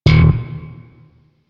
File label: Shawzin Slap Oct One D Sharp, Chords; Music; Warframe; Sentient Shawzin